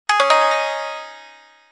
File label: gayageum sharp; SKY IM-A710K-VEGA-Xpress; keytone